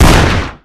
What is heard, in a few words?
Gun Pistol Shot; SFX Kit; Weapons